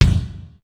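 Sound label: wet flange kick; sr16 bd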